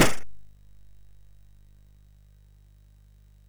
Power of Black Bandit, MOS6581R2

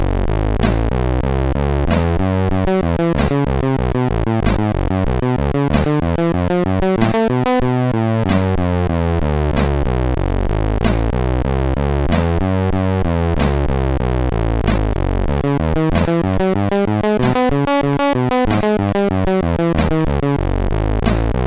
Castle Blues, MOS6581R3

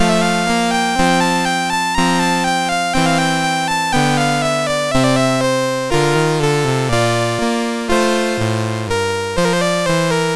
Poloniase in F Major, MOS6581R3; Joseph A Grau

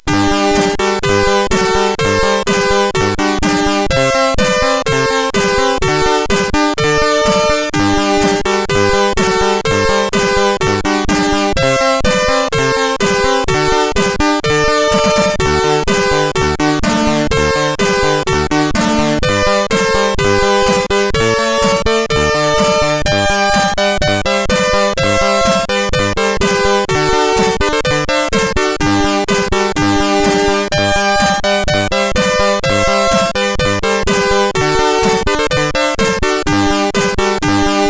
German Anthem, sid, CSG8580R5; Wijnhoven Joachim

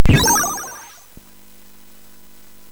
SFX, MOS6581; Narco Police; MOS6581R4; hvsc

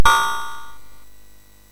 Fast Sfx, MOS6581R3; hvsc

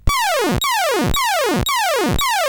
sid CSG8580R5, GAMES; Android 2

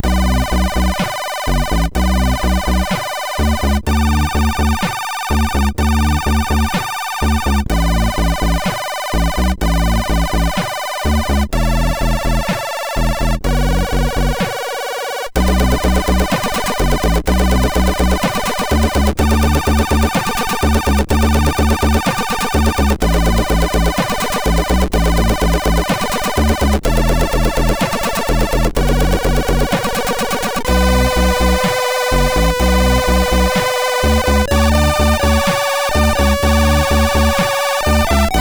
Sad End, MOS6581R4

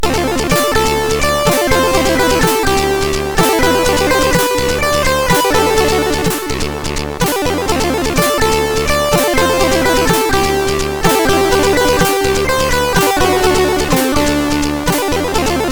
New Tune, sid MOS6581R3

